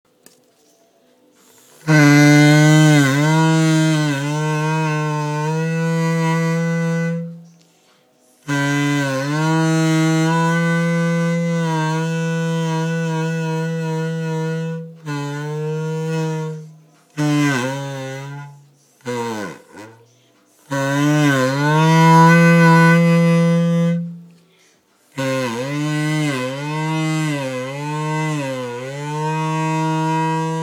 Glove o phone sounds

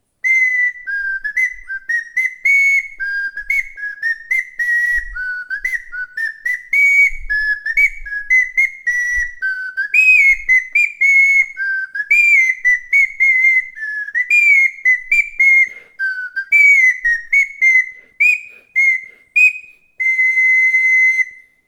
SYRINGE SLIDE WHISTLE

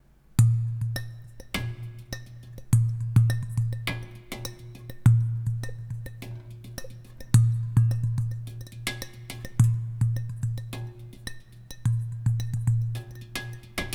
Water drum; Making a Water Drum

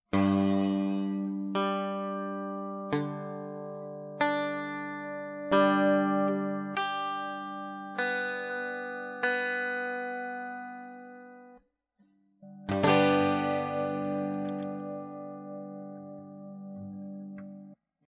Clean Tuning Guide, Guitar; The Hero Guitar

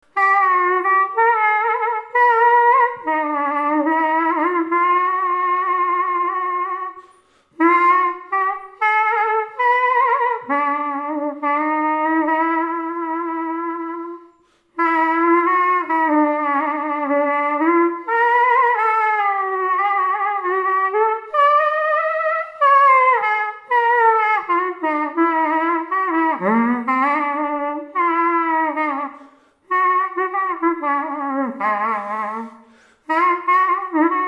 SAX TOOTER; Tootophone Sax and Trumpet

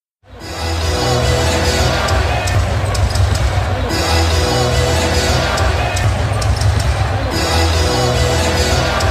court sound, Miniature Basketball Court; Kobe Themed

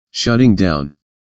shutting Down; The ITea Your Personal Tea Monitor

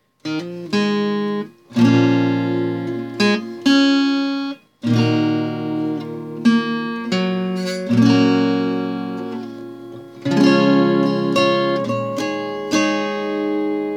AC Guitar

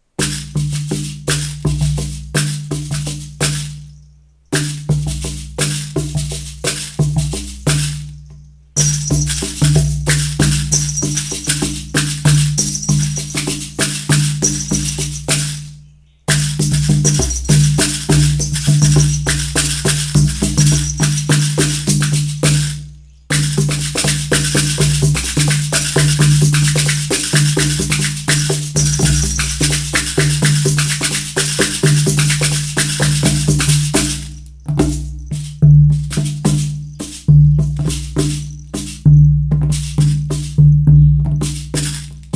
C djembe; Percussion Add ons for a Drum

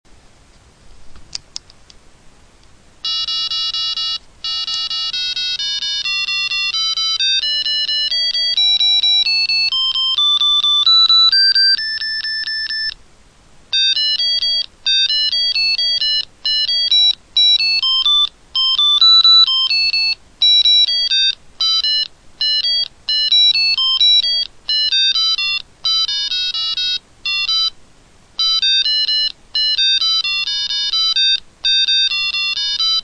Minor Pentatonic

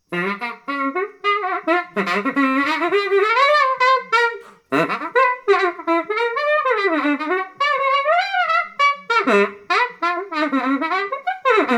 sax tooter; Tootophone Sax and Trumpet

